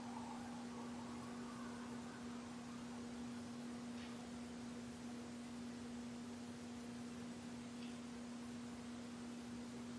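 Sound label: Ballroom Static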